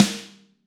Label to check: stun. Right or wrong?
Wrong